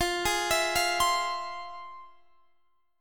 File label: F Minor Major 7th, Guitar Standard, chord; arpeggiated; capo 9